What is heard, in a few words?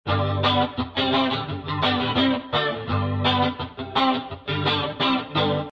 xantha main lp, A xantha The xantha was a difficult musical instrument; plucking strings and covering holes with one's fingers; Goroth Prime; Star Wars Galaxies; Star Wars: The Old Republic